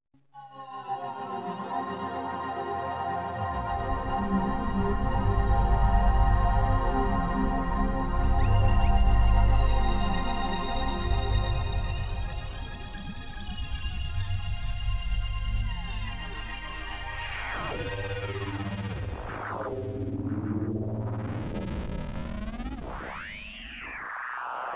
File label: Ambience; Red Faction Music